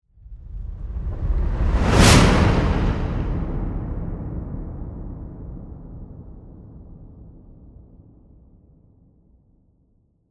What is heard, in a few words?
whoosh